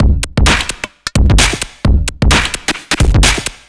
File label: Beat VT Echo 130, distorted drum loops 130bpm; musicradar